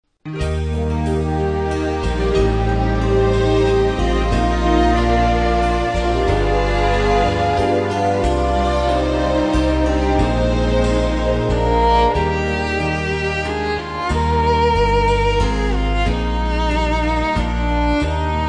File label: Instrumental Praise